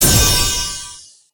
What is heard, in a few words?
Multi Shot; bejeweled 2 deluxe